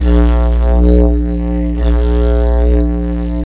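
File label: DIDGER, didgeridoo; E-Lab; X Static Goldmine 1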